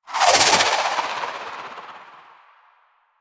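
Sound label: Charged Projectile, Sidearm; Weapons; Warframe; Tenno; Wraith Sidearm; Epitaph Prime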